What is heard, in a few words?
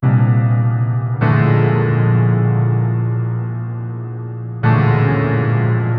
horror rap piano